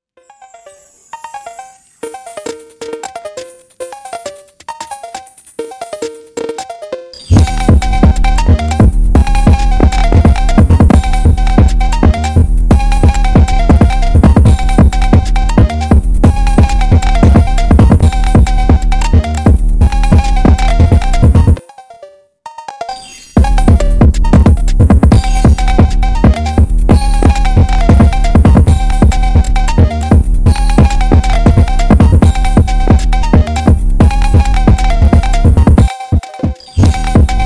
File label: Phonk Drift New Year sped Up Songs Music Remix